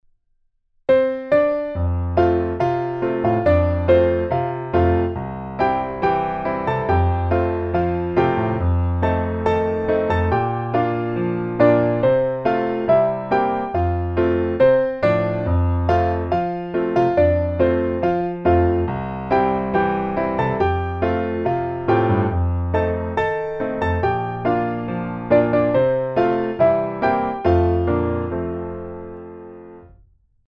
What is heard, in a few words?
piano